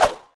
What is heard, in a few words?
player dodge; HeavenStudio; trick Class